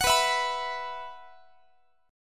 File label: B Suspended 4th, Guitar; strummed; x,x,21,21,20,22